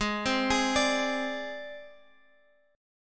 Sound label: Ab Major Flat 5th, Guitar, Open G; down